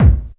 techno 2, bassdrum; Ueberschall; Techno Trance Essentials; DRUMS; BD TECHNO1

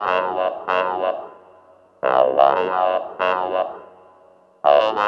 Vocode FX; Sonic Foundry (Sony Creative Software) - Stylus Pressure Urban Grooves on Digital Wax; Alien Tribe

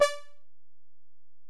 Bell, Alesis DM-PRO

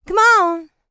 LICKS, Vocal R&B Dance; LT DANCE

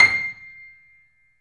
The Piano, C; InVision Interactive; BOES PLAY HARD

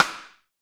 SD RI, SD Rim; Sound & Vision - Gigapack I

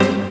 HOUSE, House Dance

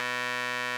RMI; Sonic Reality - Vintage Timetraveler

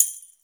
TAMB, TAMBOURINE

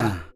perc voice, Roland MC-909

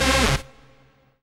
RAVE, RAVESYN

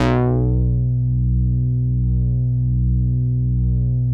OBERHEIMS

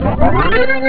organ slide; jazz jackrabbit 2; M8

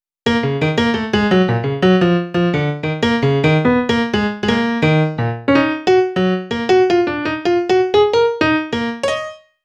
Piano Solo, Cinematic Funk; Foundry (Sony Creative Software); Cinematic Funk Urban Tone Poems; Solos

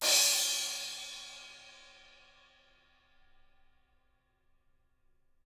ZILDP CRASH; CRASH 16

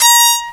FAIRLIGHT CMI IIX, STRINGS

